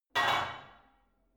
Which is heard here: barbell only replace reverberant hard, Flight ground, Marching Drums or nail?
barbell only replace reverberant hard